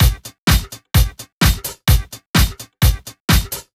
House Beat, 128 BPM

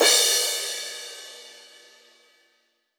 Crash, Cymbals; Vengeance Samples; VEH3 Cymbals

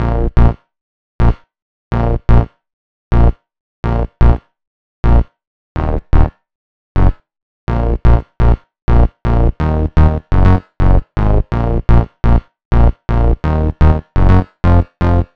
Melody 3, 125BPM; Vengeance Samples; Cutter Kit

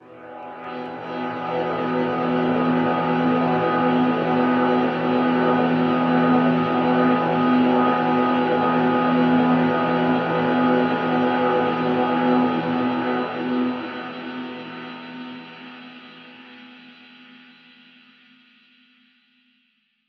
Pad; Dubstep Sample Pack

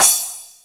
Cymbals crash; Vengeance Samples

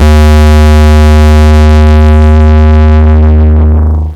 Root D#, Bass

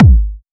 Hard Kicks; Vengeance Samples

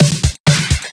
Fill Ins 128BPM; Vengeance Samples; VEH2 Loops

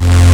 Synth Bass; Vengeance Samples; VEE Synth Shots

